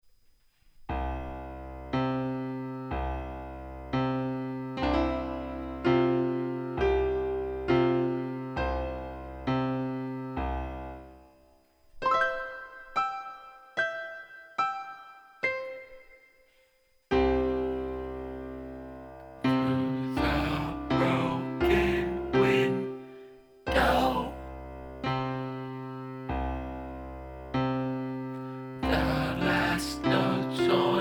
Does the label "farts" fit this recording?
No